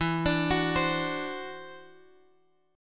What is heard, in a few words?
E 7th Suspended 2nd Sharp 5th, E, down, Guitar, DADGAD